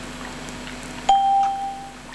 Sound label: PING; expeditioner op blackmoon